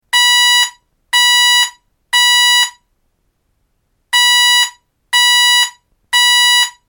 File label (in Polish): ton